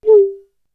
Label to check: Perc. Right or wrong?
Right